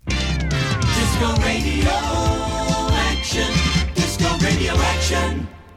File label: topformat maxi jingle single